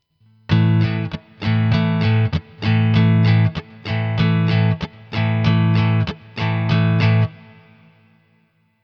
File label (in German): Schlagmuster Übung, gitarre